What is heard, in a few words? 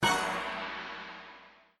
BONUS LAND; Lucky Wok